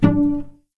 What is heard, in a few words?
D3 PIZ CEL, string; East West; The Ultimate String Collection